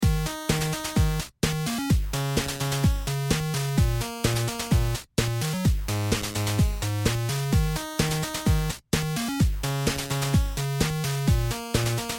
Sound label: beat